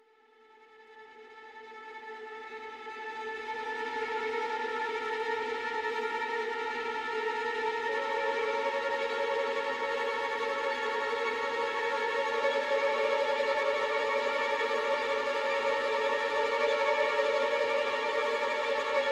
Suspense Strings; Ship Suspense Strings; Far Cry

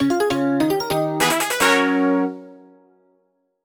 Jingle Strike; Clubhouse Games: 51 Worldwide Classics

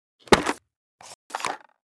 Dryer Opens; yesterday origins gamerip 2016 switch ps4 pc ios macos

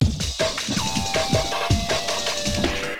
Combat START, soundtracks

